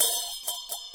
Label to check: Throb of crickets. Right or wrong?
Wrong